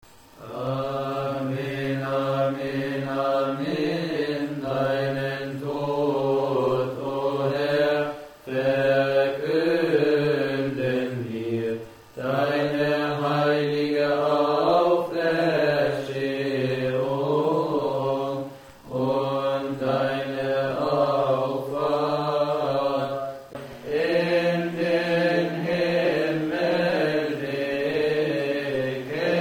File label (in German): amin amin amin (griech melodie); Liturgie-Volk-Gebete Deutsch